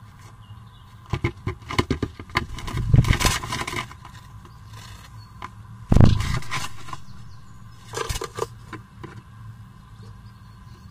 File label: curious sparrow, nestbox